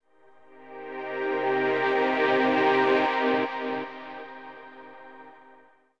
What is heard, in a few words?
SYNTH PAD AMBNT 160 C, Ambient; eJay; Sphere